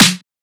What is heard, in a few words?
snare hip; Drum One Shots; SD MIDNIGHT Sample Pack; Slate